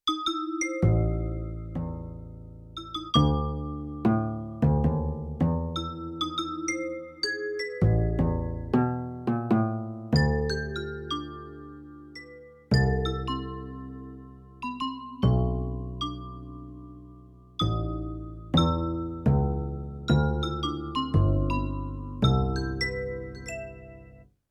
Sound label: VIBES-BASS SPLIT; Yamaha TX16W; Jazz Performance; Jazz Performance 2